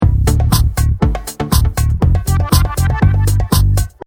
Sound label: Electronica